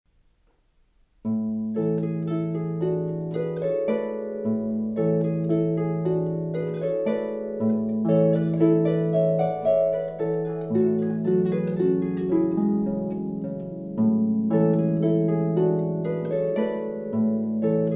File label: Flower Duet, harp